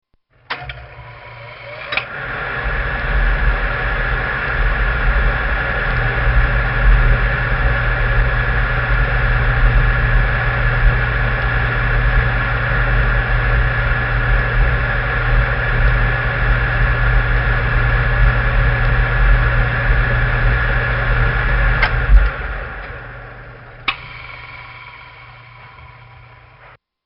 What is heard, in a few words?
Furnace Start Run Stop; Furnaces (Sound Effects:Household)